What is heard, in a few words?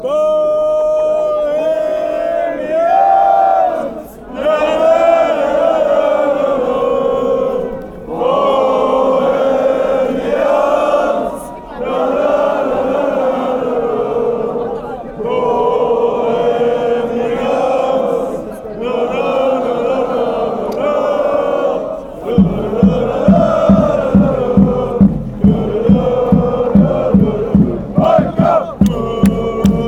fanchants; bohemians dudududu